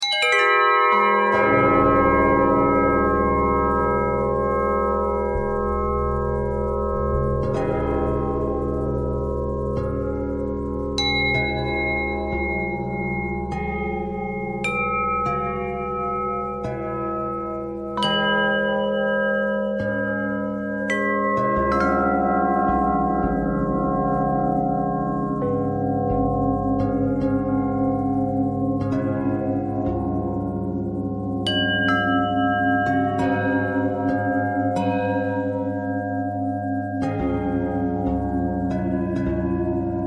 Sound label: bell bell thread pentatonic, bells